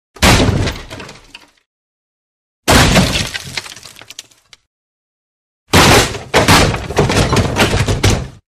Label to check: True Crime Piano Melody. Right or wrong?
Wrong